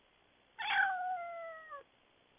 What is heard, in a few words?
cat meow